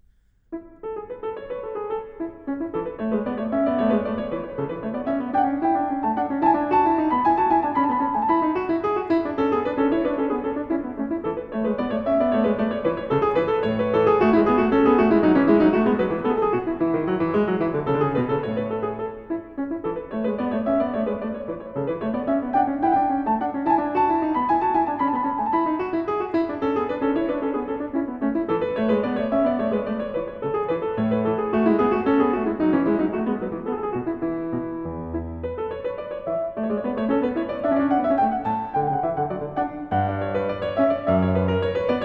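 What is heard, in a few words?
English Suite in A Major, Bourrée; English Suite No. 1; BWV 806; Bach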